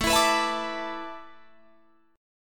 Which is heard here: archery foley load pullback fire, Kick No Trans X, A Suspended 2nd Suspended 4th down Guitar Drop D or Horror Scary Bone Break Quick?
A Suspended 2nd Suspended 4th down Guitar Drop D